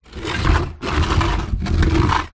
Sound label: household mop bucket plastic empty move slide on hard floor